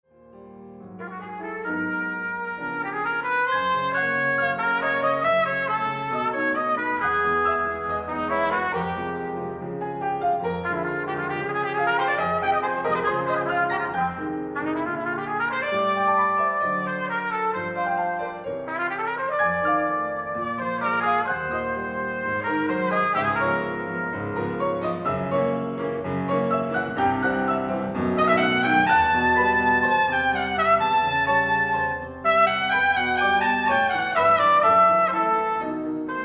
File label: Trumpet